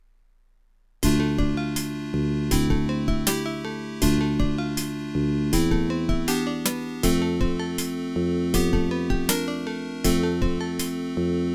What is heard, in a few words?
ADLIB OPL2